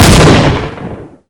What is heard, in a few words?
explode